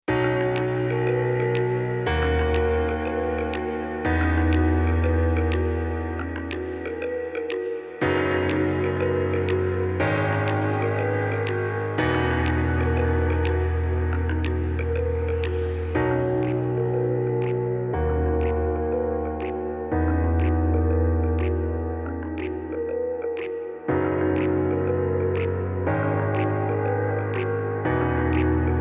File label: Instrumental